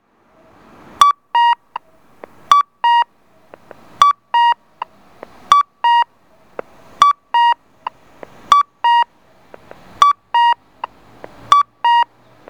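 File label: accessible pedestrian signal, kakko; onkyo kosaten; aichi; tokai; yokosukamachi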